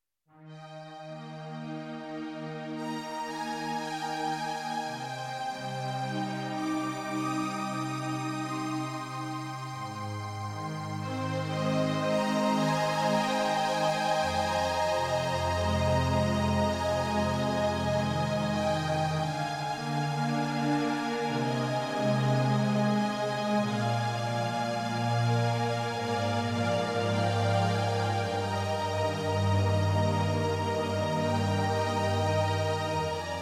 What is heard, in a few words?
Strings